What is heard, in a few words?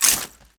foley